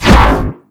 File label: miss; slender; marauder